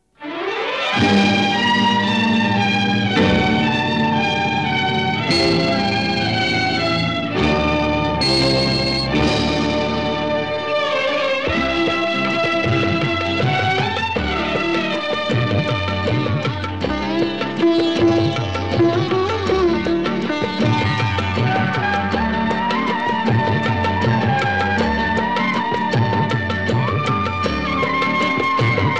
Theme Instrumental; Mere Humsafar

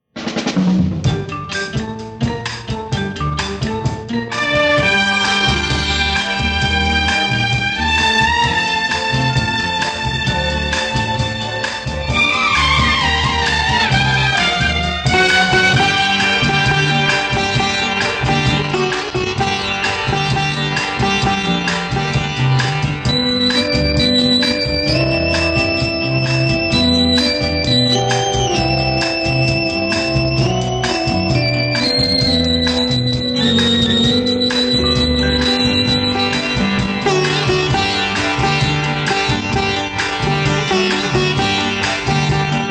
Theme Instrumental; Rangila Ratan

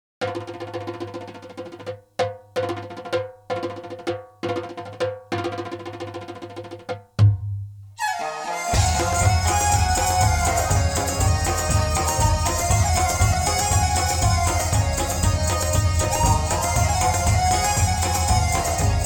Victory Theme

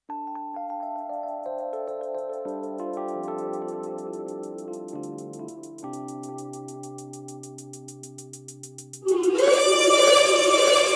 Instrumental

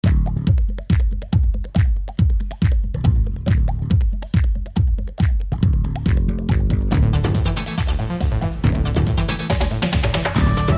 Instrumental

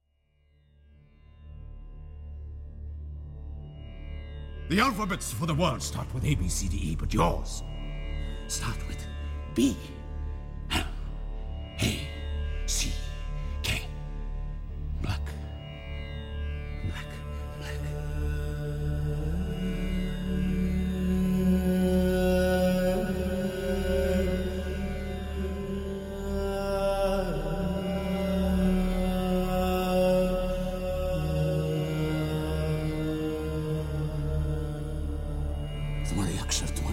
Instrumental

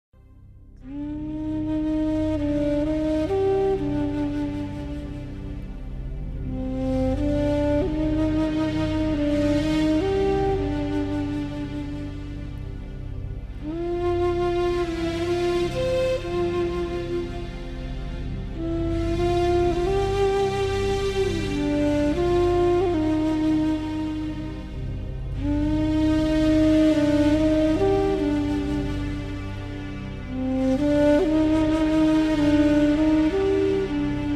Instrumental; Duvidha

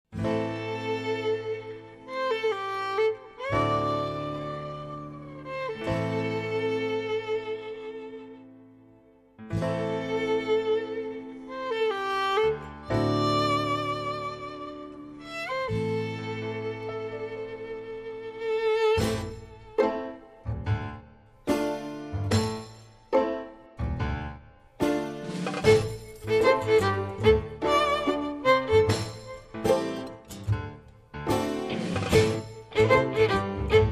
Instrumental

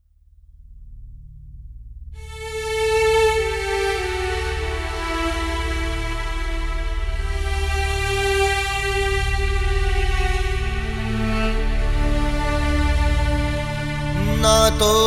Sad Version

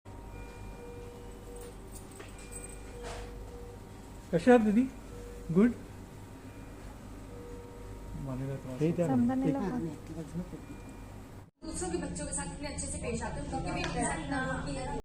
Instrumental

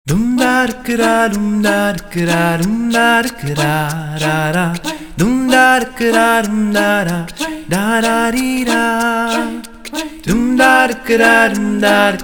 Capella

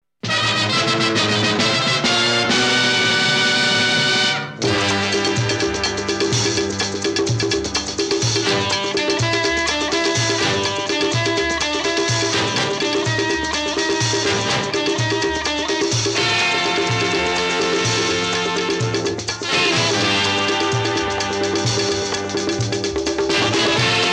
Theme Instrumental; Rakhwala

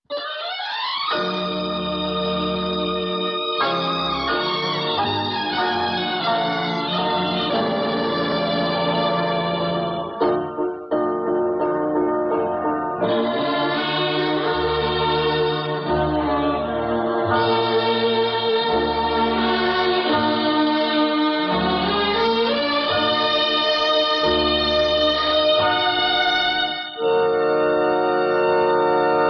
Theme Instrumental; Anupama

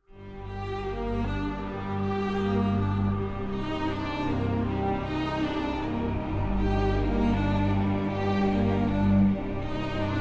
Instrumental

